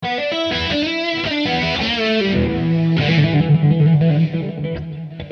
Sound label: E 080-100 solo